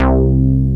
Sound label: MOOG BS; MINIMOOG; Greytsounds VOL-1